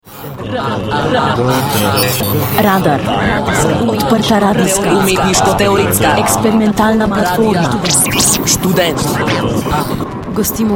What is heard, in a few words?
JINGLE; KUSUM; RADAR